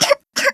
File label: double cough; juniper